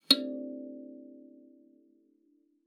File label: KALIMBA D5; Xsample VOL-7